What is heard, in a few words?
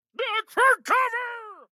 town human road; Blind Drive